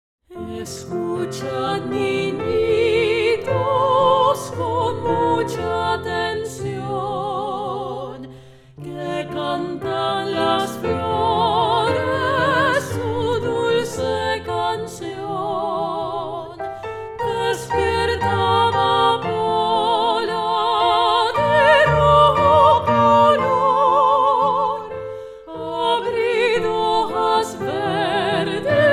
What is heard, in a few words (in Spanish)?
La marcha de las flores dos voces